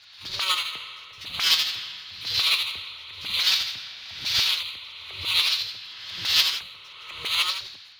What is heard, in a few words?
Noise, Processed Noise; hiss crackle